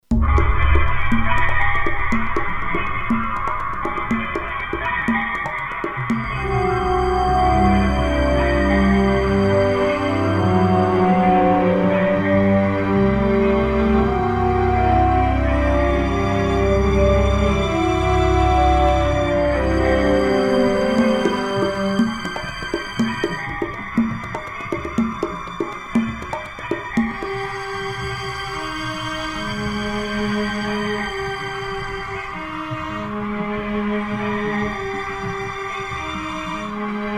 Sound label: Orchestral Chaos